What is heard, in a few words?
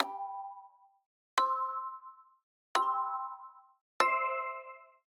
Generic Click Digital